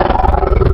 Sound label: Panther, Wavetables; Cymatics; Serum; Animals for Serum - Gold Edition